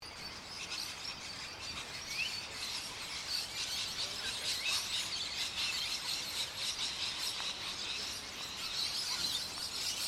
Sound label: Ambience Australian Sunrise Noisy Birds Loop Surround Front LR, Morning Ambience; Morning Ambience in suburban Melbourne; Outdoors